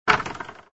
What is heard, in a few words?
zsprong; mid flight